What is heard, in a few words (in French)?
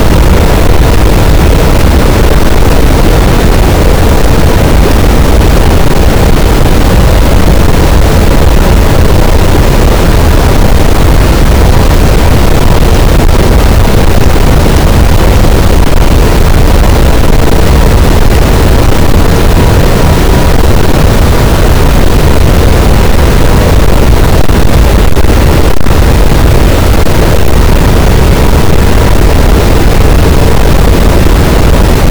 cachalot, Physeter Macrocephalus, gaussian noise